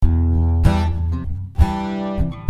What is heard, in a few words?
Strummed Em,C 98